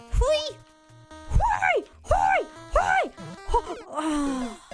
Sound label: try hard noises; Mario